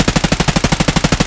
aps outdoor distant loop; Escape from Tarkov

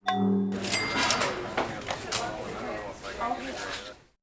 Grocer; Civ4 warlords; Buildings

